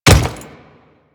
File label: Default Step, Footsteps; Royal Guard